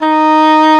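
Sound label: english horn; E-MU Formula 4000 Series Vol. 5; Protozoa; Proteus2 Presets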